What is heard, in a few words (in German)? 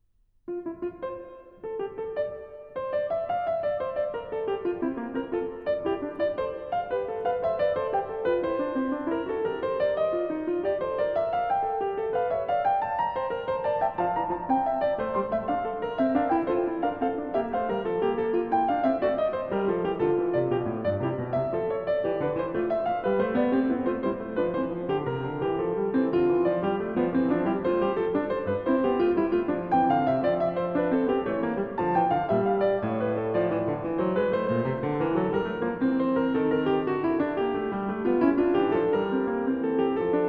Fuge F-Dur; BWV 880; J.S. Bach; Das Wohltemperierte Klavier Book 2